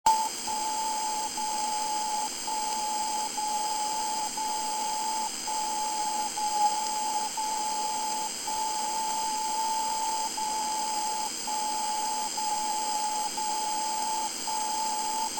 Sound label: DCF77, VLF receiver (16 - 80 kHz); Sound recording of DCF77 time signal (77.5 kHz), including the 1-minute marker